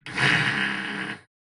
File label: Fart Noise; SoundsCrate